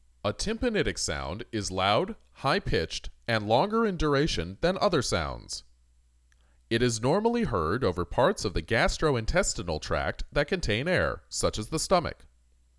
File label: Percussion